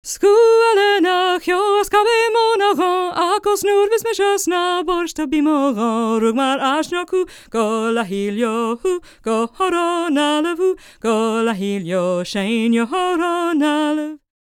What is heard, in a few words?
GAELIC, World Voices, GAELIC FEM